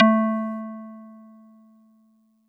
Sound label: Marimba DX100 A4